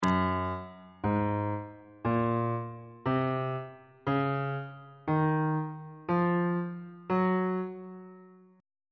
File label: f sharp lydian mode note positions